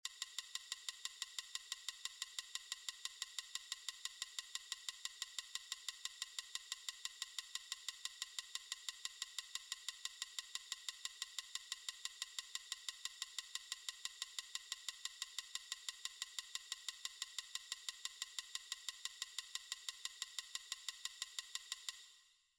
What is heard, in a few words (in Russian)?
метроном 360